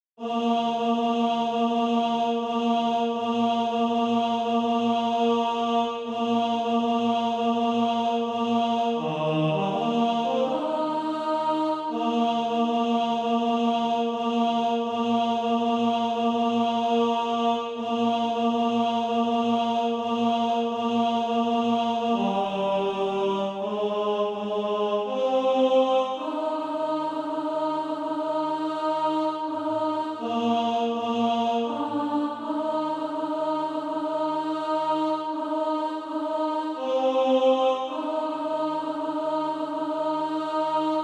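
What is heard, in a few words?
tenor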